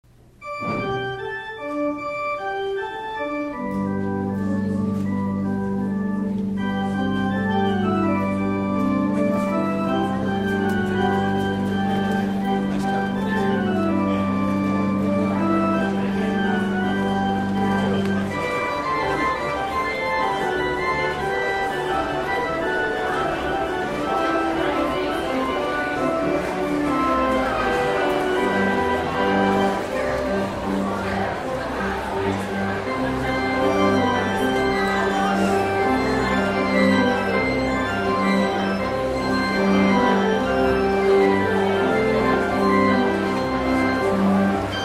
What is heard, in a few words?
Postlude Ding Dong Merrily On High